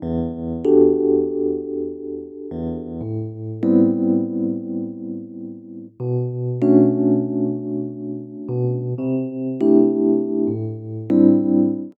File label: Pop MarkII E; Pop Rock; Pro Tools Essential Demos; Digidesign; Essential Loop Demos; Demo Session - Pop Rock